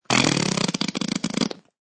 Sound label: Slow Fart